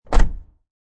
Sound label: Car door is shut; Door Closing; Sound Effects:Doors